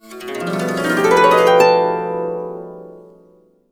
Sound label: Complete Harps; USB Soundscan vol.62; AKAI